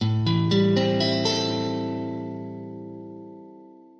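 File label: A min7, Guitar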